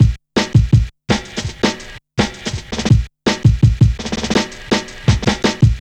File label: Check A, Jungle, Drum Loops 165.5; Zero-G Jungle Warfare 1, 2 & 3